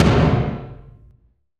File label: Tom, Gated Reverb; musicradar